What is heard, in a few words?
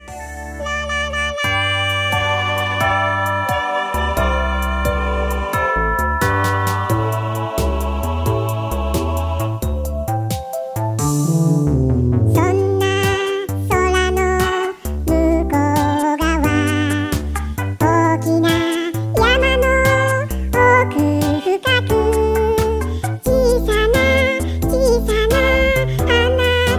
ah lalala